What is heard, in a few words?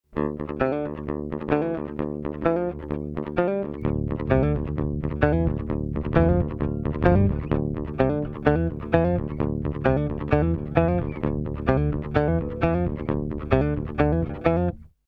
Pick Comp Ultrabass